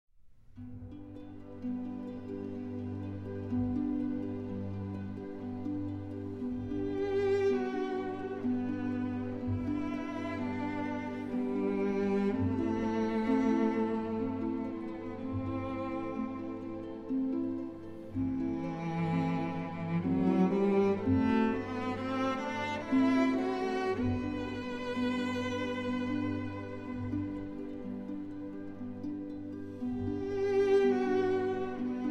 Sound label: The Swan (Arr. for Cello & Orchestra); Carnival of the Animals, R. 125 XIII.; P. Vidal